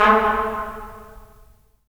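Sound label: brass trumpets staccato, right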